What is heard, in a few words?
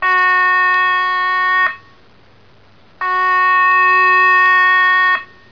nautical